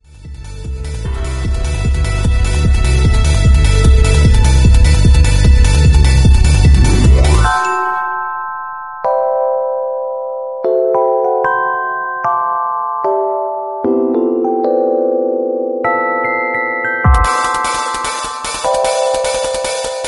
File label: trance